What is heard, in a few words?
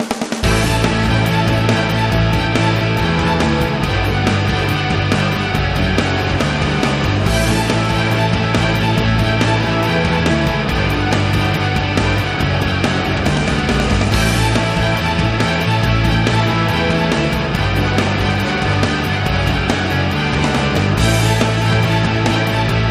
Electric Rock